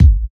natural kick single hit g sharp key

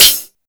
wet pedal hi hat sound g key; tuned drums

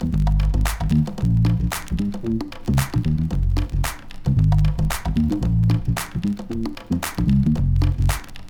feel bass - a sharp m - 113, funky; loops; esmeralda

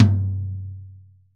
long room reverb tom g key; tom drum samples

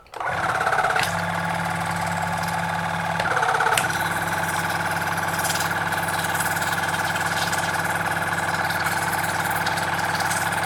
oral irrigator sound effect water

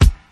good high hat g sharp key

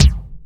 clicky kickdrum c sharp key

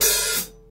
dampened open hat one shot g sharp key; open hat samples

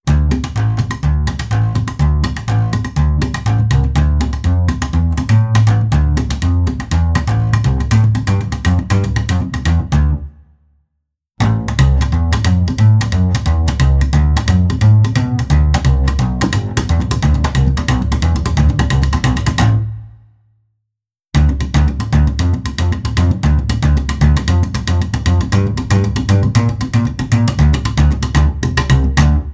acoustic double bass rocking sequence

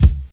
hip hop kick single shot c sharp key; kick drum samples

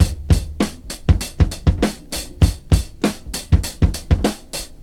100 bpm breakbeat sample d key

